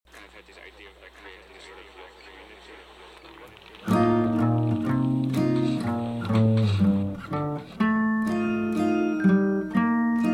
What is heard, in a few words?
Lullabye Instrumental